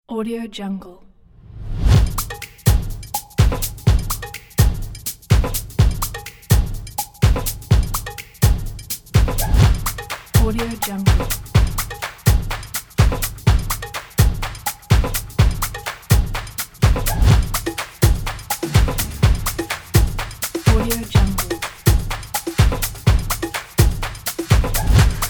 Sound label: the stomp percussion